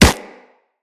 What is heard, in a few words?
Kubrow Run Snow, Footsteps